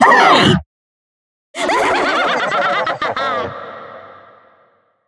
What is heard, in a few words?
Jump Scare Voice Laugh